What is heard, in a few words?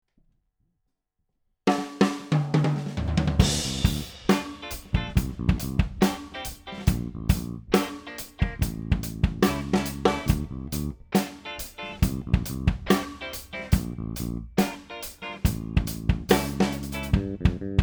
Groove 140bpm